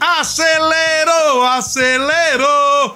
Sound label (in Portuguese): acelero acelero cantando